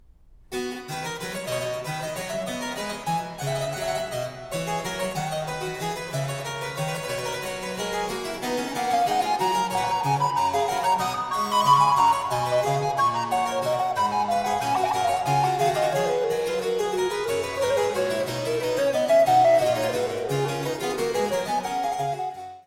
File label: Flute sonata Allegro